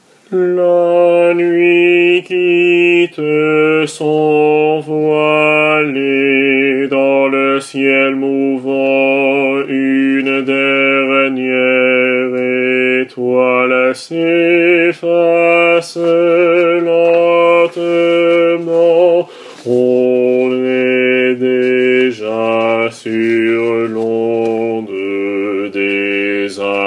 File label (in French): basses